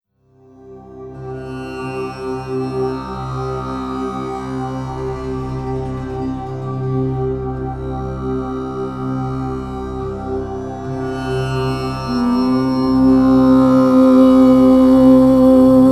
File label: Moola Mantra